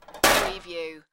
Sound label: Metal Debris Impact Short Medium